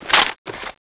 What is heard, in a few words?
mp7, weapons sight